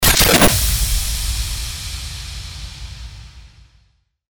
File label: FX STARTER IMPACT